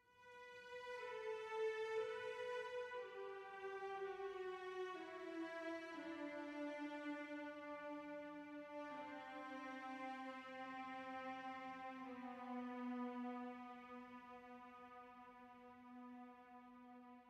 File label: Stinger Gameover Positive Stem Celli